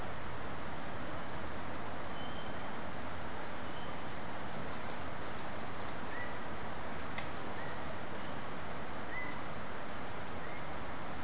európai törpekuvik hangja

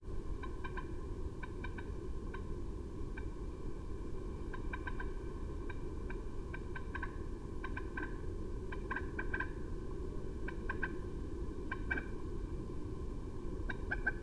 vizityuk hangja vizityuk Gallinula chloropus

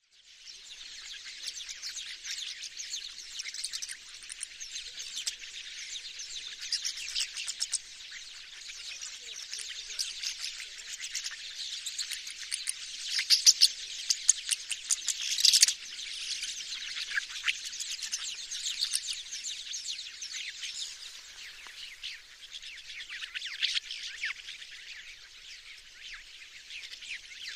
pasztormadar hangja

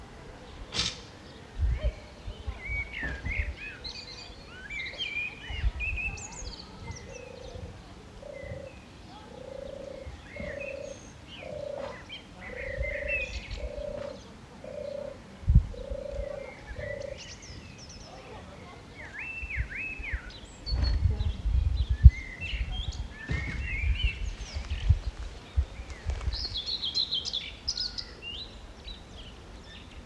vadgerle hangja

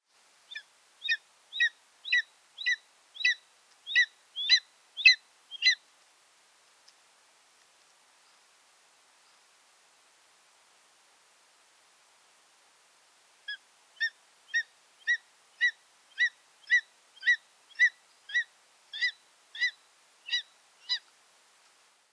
fekete sas hangja

